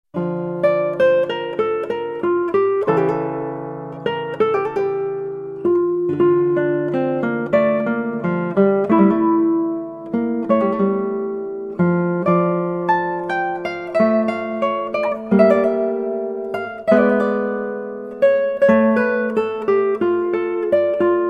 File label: Prelude, Guitar and Ukulele; JS Bach; Johann Sebastian Bach